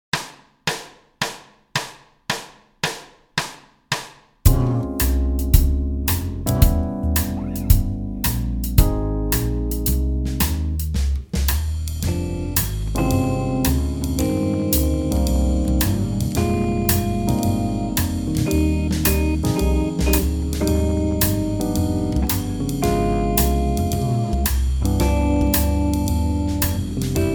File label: improvising backing, guitar